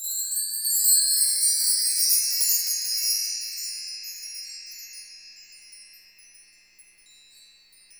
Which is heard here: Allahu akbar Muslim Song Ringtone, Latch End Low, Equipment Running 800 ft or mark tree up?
mark tree up